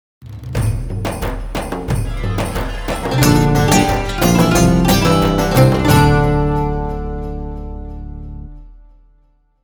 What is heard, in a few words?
sound logo folkloric; gaziantep city